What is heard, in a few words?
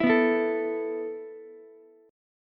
E Suspended 4th E, down, Ukulele; Esus4 strummed